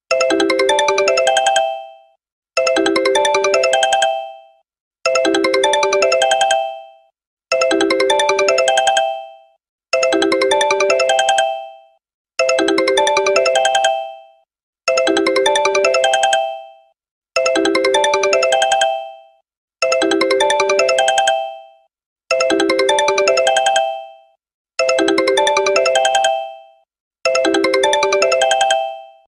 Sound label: sony ericsson